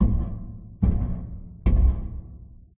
Animatronic Move; Those Nights at Rachel's

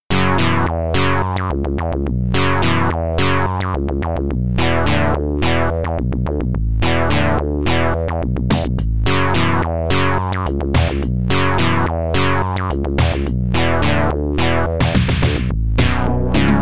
MT-32

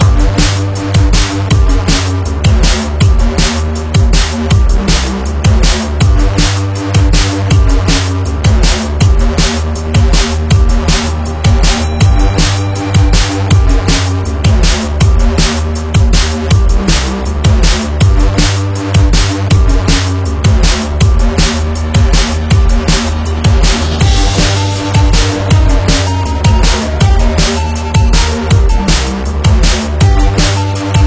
Tension Loop

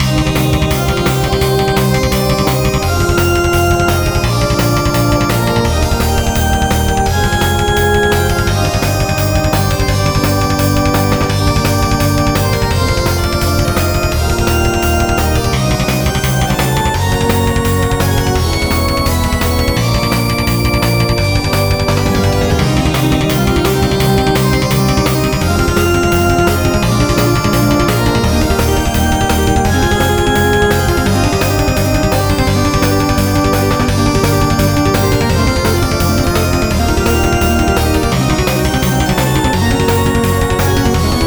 Loop; Super Gear Quest

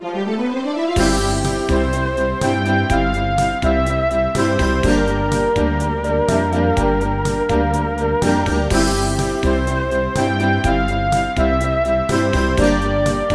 Victory Aftermath; Chronicles of the Dark Lord 1

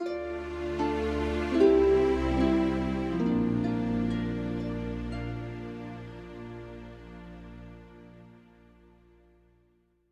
Bgm Gameover; aster tatariqus android ios mobile gamerip 2023

